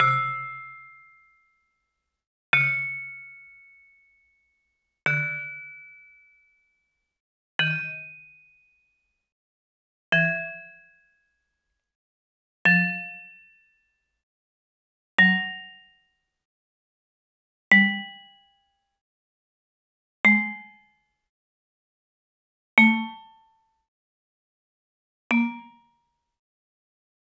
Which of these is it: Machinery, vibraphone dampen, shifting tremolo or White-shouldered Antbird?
vibraphone dampen